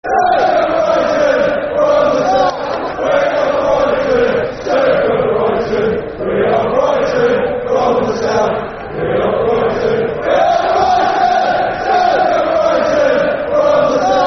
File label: Super Brighton, Football Chant (Soccer Song); Brighton Bhafc